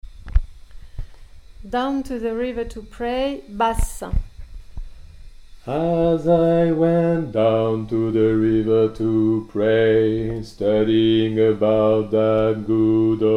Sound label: basse